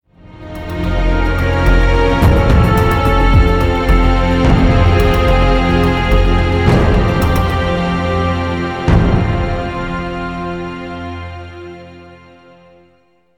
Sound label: defeat